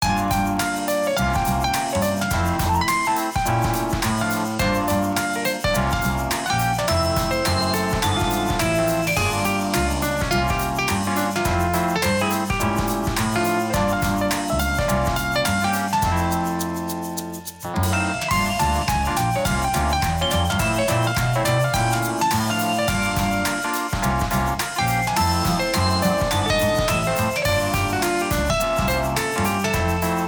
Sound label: Piano Dance, ringtones; LG isai LGL22